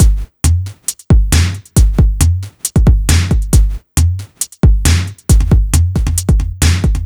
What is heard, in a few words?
136 drum loops; Crawdaddy; M8; Electronisounds